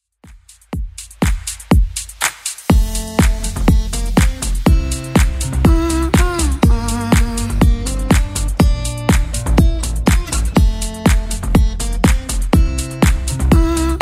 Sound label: Top40 Club Edit 122bpm